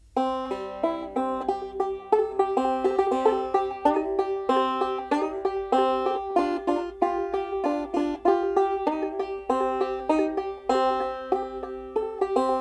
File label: steel